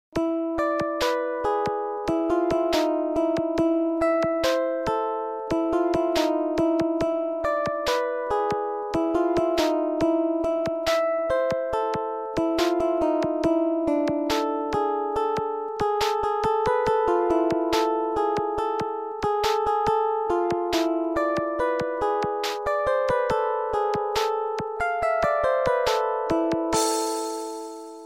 La Cumparsita Remix

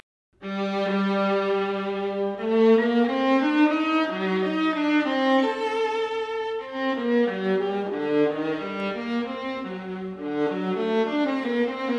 Strings; Bach

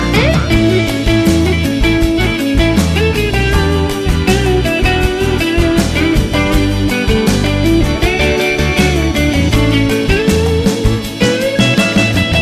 Happiness Tune

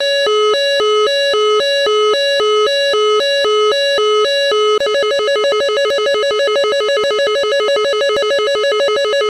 Locked Launched; F18

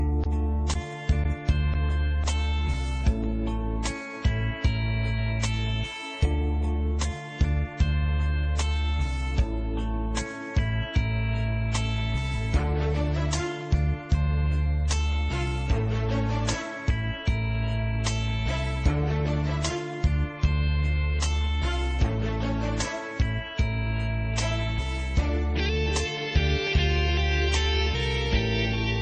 Smooth Persian

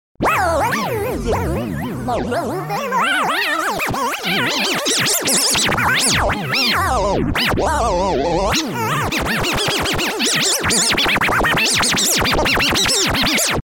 Disgusting Noise

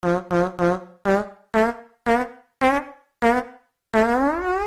Fart Contest

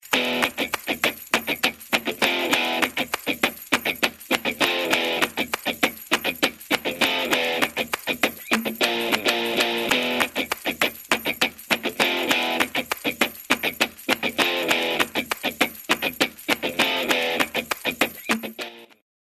Guitar

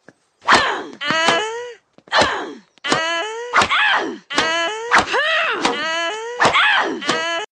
Funny Moan

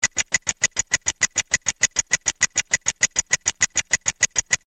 Clock Ticking Fast

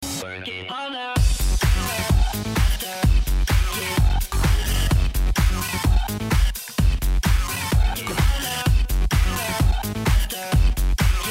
House

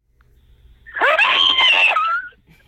Obnoxious Scream

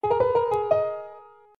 Chime; NFL

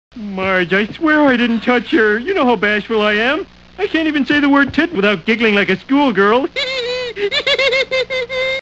Giggle; Homer Simpson

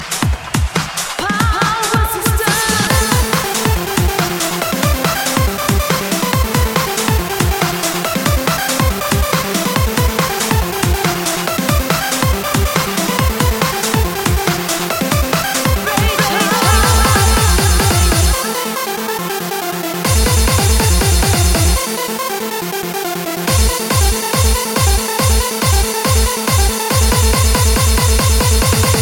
Disco

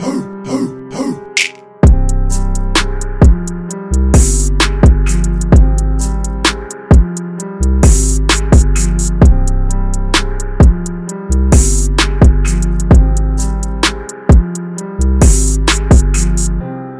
trap ringtone

